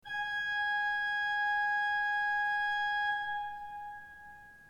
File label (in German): ton, orgel